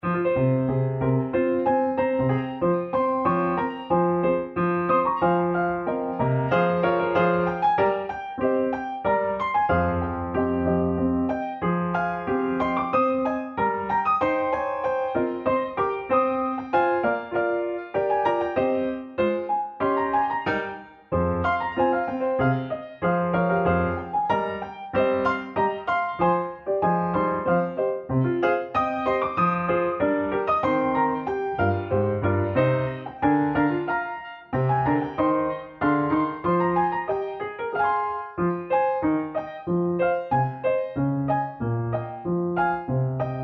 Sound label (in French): PIANO ALLEGRO DE PRINTEMPS